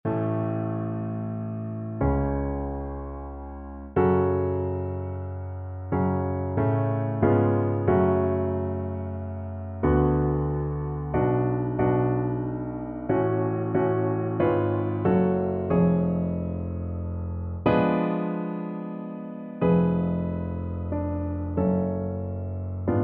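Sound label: viola karaoke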